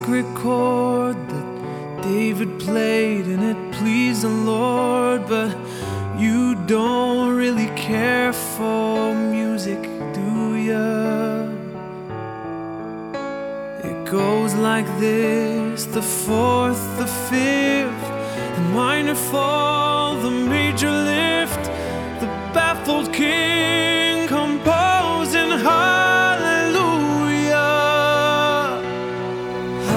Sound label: Tenors - Hallelujah